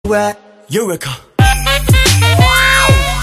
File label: Sax